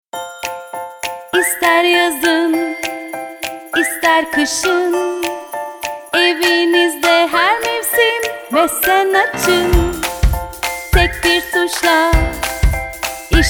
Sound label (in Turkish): vokal jingle; vessendemo